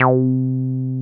303 C4 f; Drums In Your FACE!

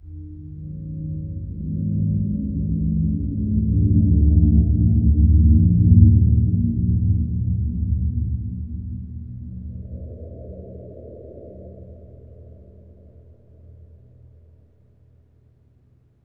Scary, nightmares; Dream Zone

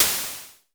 SN Basic, Vermona Mono15 Drums Snare

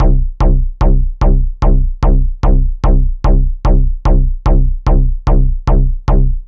Solid Bass; Dr. Fink's Funk Factory

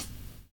JABO KIT; Univers Sons

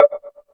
House Machine, Drum Machines

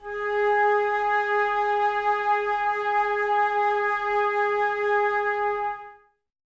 Flute, Woodwind

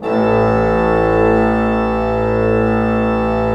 PLENO A1, Cathedral Organ, PEDAL PLENO; Propeller Island

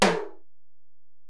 Tom, Alesis DM-PRO, Drum Machines, Toms

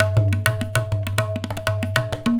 100 TABLA, Indian Traditions; USB Soundscan vol.45; AKAI

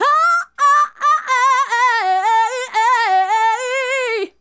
Female Solo, Hip-Hop and RnB Vocals

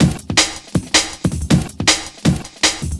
Beat, Futurist Drum & Bass; Drums & Percussion

